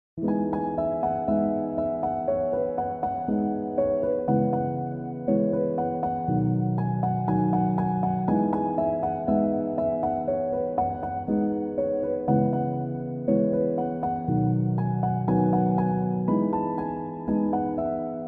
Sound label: chill piano instrumental